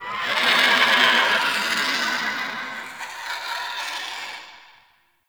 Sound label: Dungeon Island Vultures, Ambience; Warframe; Duviri